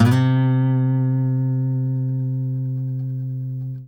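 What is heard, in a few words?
trill, Guitar; Quantum Leap; Guitar & Bass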